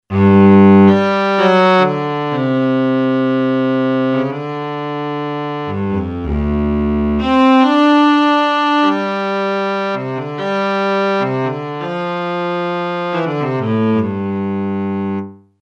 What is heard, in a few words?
cello